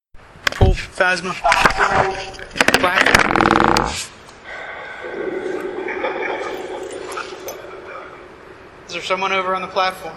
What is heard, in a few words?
Pool Phasma Box; beaver area high school investigation